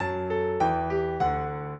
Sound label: minuet, piano; Mozart